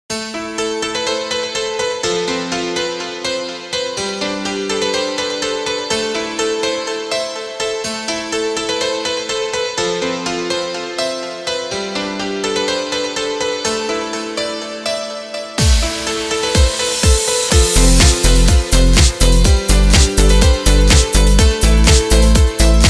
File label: PIANO